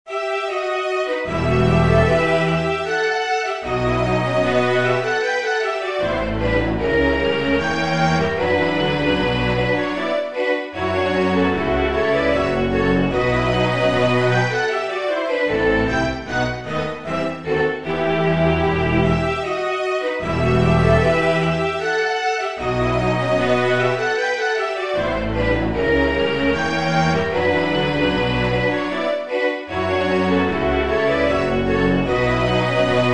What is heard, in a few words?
Courante in E minor